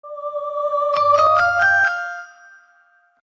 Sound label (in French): sonnerie aeroport de paris ringtone